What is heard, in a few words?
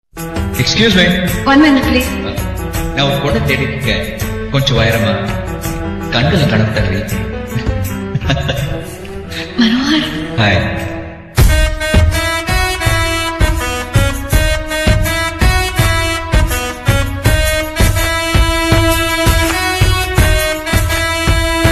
bgm keyboard piano; Thala Ajith